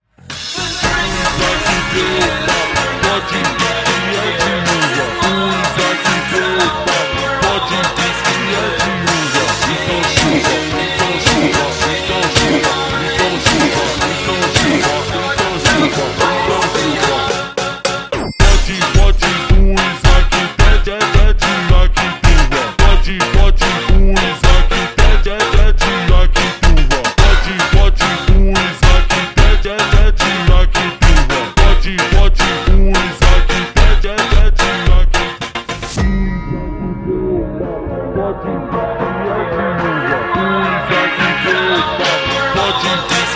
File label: Christmas Kids Funk super Slowed